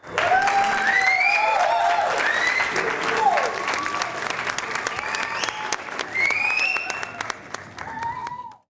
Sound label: applause, people sound effects